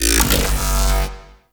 Alt Fire Growl; Weapons; Warframe; Corpus; Tenet Quanta

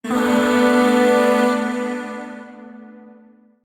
Shawzin Maj Chord L; Warframe; Zariman; Void Shawzin; Chords

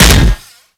Impact Metal, Weapons; Warframe; Furax; Impacts

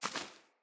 Wing Flap One, Animals; Floppet

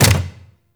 APC Turret Dry Fire; Warframe; Lasrian Turret

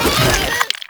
Infested Runner Jump Whoosh A; Enemies; Abilities; Warframe; Proto Infested

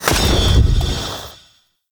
Spear Impact

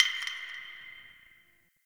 Interior Distant Marble Hit; Warframe; Ambience; Nineteen Ninety Nine

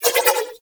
Nunchaku Prime Spin Whoosh; Warframe; Tenno

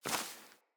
Wing Flap One, Animals; Floppet

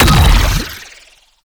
Octopede Doom Pulse; Warframe; ManInTheWall

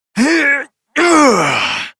Exertion, Adult; Warframe; Adult Operator; Dialog Barks

